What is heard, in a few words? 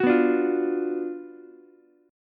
Gb Major 7th Suspended 4th Sharp 5th, Ukulele, GbM7sus4#5 chord; GbM7sus4#5 strummed; JGuitar